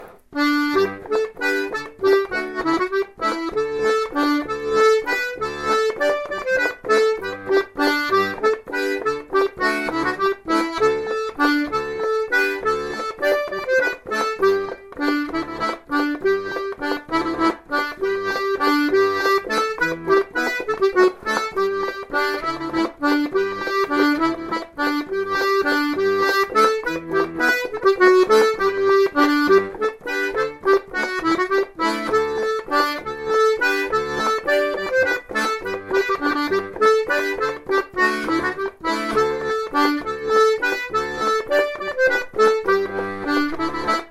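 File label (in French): bourree mussee, accordéon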